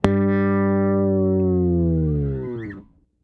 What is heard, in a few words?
FALL G2, BASS, EFX FALLS